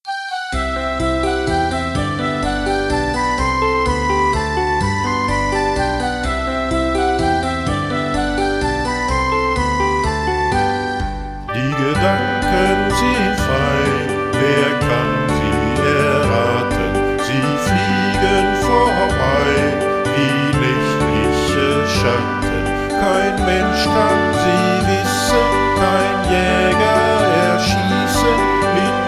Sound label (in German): kinderlieder die gedanken sind frei vocal